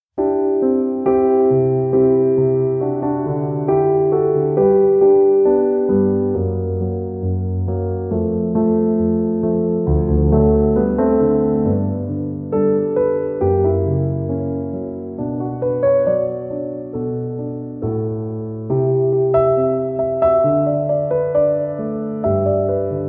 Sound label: Piano Instrumental